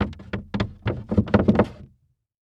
Foley Footstep Hard Sole Dress Shoe Stumble On Wood Platform; Foley Footsteps